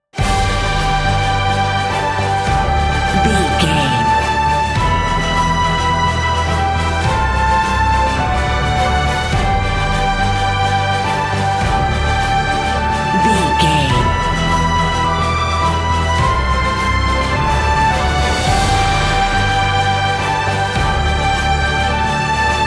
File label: cinematic adventure